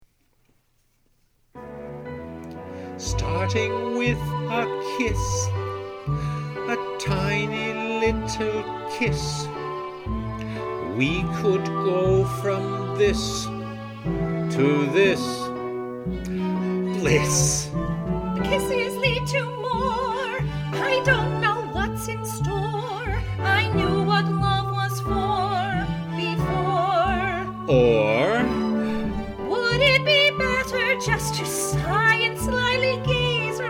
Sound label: Vocal